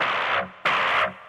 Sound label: repetitive bursts, zaps and beeps